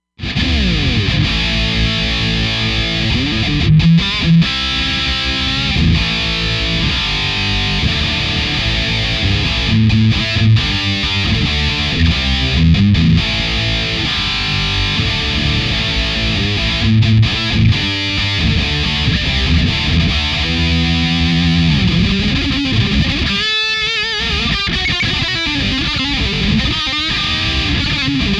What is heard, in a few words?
guitar test heavy